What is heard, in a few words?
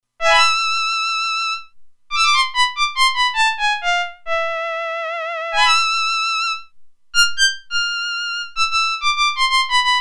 Solo Violin, Roland Alpha Juno-2; Original Sound Chart patches